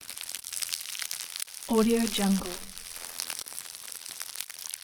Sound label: bugs crawling